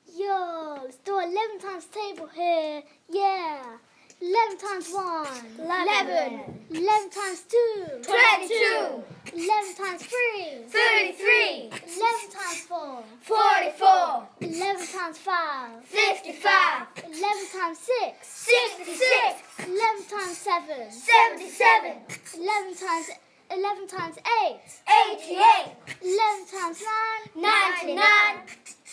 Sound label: 11x table rap